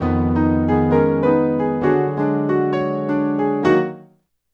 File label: Piano, Acoustic Pianos; Sonic Foundry (Sony Creative Software); Mac Money